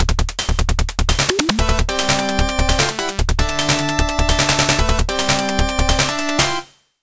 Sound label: Victory!; Wrestle War; Genesis